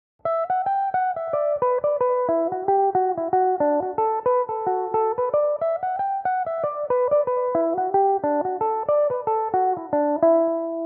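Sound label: E minor, Irish mandolin; slap on the wrist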